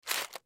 Box Pick Up Candy, Box Pick Up Candy Mints Package; Pick Ups (Sound Effects:Objects); Foley; Blastwave